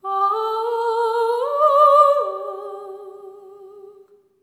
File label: SERENE, Symphony of Voices; Spectrasonics